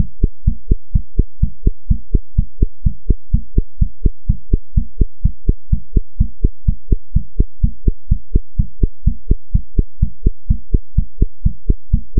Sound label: Clockwork Heart